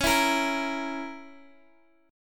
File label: C sharp Minor 6th C sharp, Guitar DADGAD